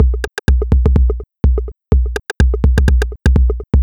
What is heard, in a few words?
Beat 125BPM (Kick Perc), techno; techno drum fx